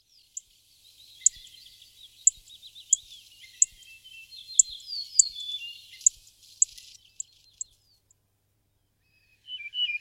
canto da escrevedeira aureolada

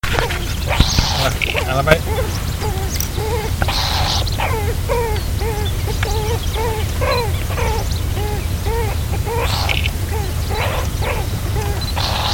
canto da narceja de bico torto

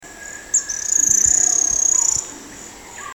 canto do patinho de coroa dourada

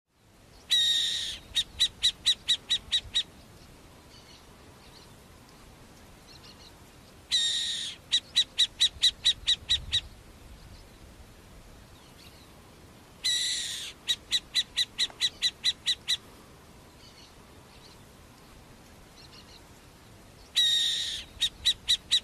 O canto da Coruja Mascarada

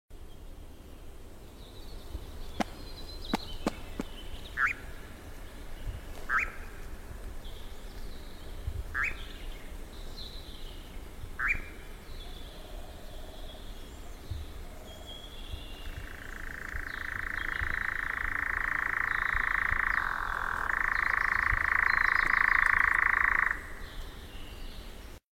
canto do noitibo cinzento

